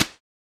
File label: Roland Juno D Brush Kit Softy Snare